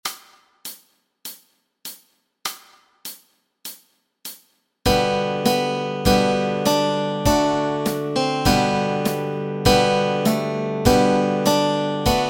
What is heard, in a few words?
melody and chords, Easy Guitar and Ukulele